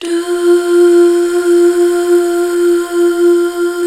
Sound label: HAL DUH F, HAL Back.Duh Fem; BACK. DUUHH